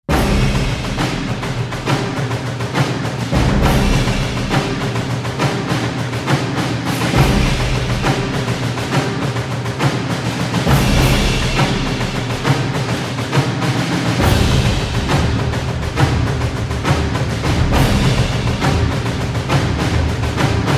Drums Only Version

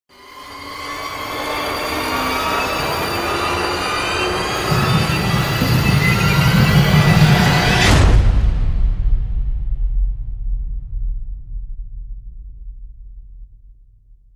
Imminent Crash; Gothic Storm Music

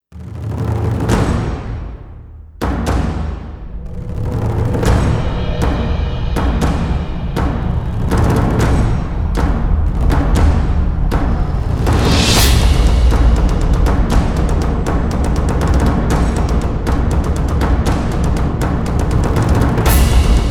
Percussion Concussion (Remix)

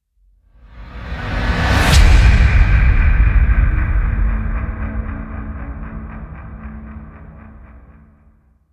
Evil Spirit Hit Swell; Ninja Tracks

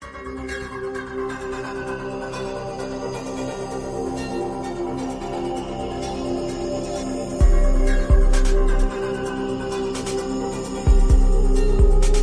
Lucid Trance; Brand X Music

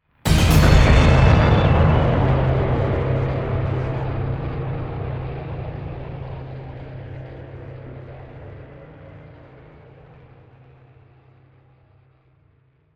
Multiple Impact; Gothic Storm Music

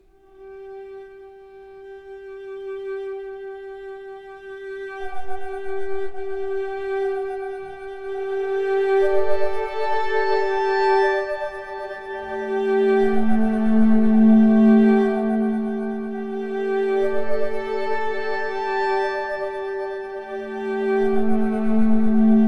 Faith Ambient

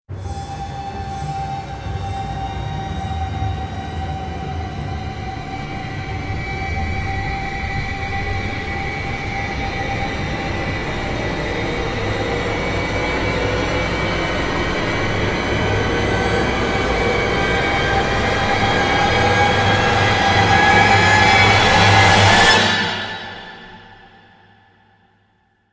Rise

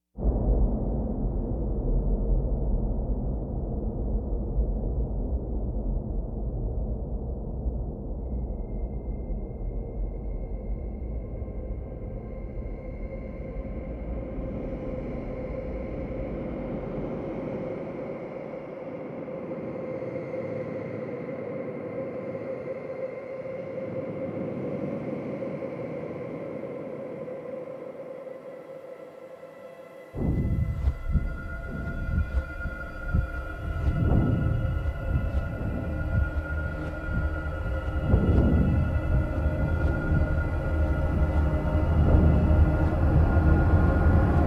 Incinerate No Strings; Ninja Tracks

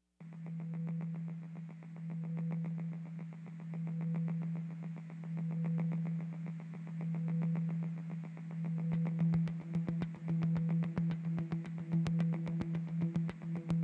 Time Flux Synths And Perc; Ninja Tracks

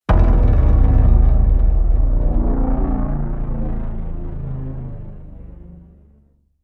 Red Giant Hit Downer; Ninja Tracks

